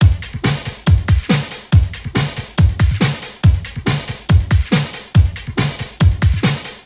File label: Loops BB 140, BreakBeats; Vengeance Samples